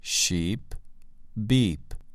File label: sheep beep